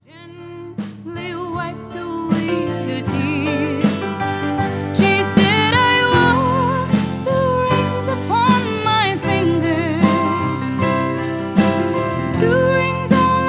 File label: Two Rings (guitar)